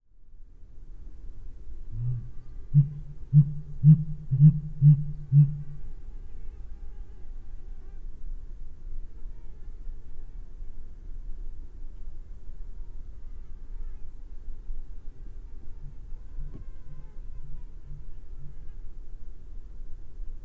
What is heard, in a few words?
Sooty Grouse, songs